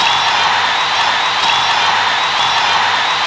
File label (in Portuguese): MULTIDAO